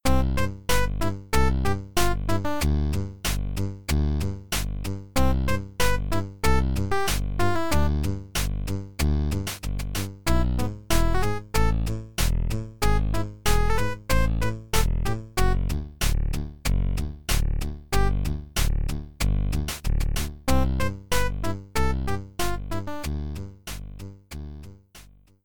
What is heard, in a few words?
Credits 8-Bit; One Night at Flumpty's 2